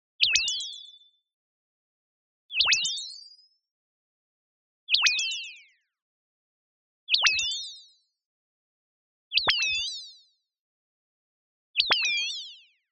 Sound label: TONAL Accent Bird Chirp; boom library; Anime Essentials